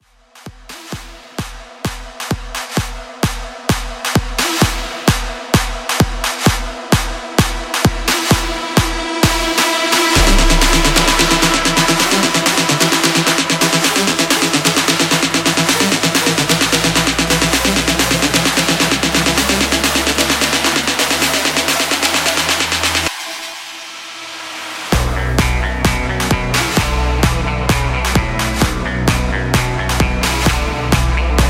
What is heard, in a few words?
Clap Blend